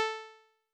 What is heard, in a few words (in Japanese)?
調波構造音（10～30本） 高域, 仮想ピッチ; 音の高さ; 高域下がり・時間減衰波